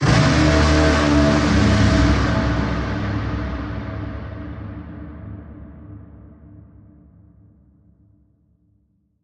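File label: Alarm Colossal Warning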